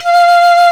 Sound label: fife, British fife; Roland L-CDX-03 Disk 1